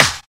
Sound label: Bright Snare C# Key, Royality free steel snare drum sound tuned to the C# note. Loudest frequency: 3376Hz; hits one shots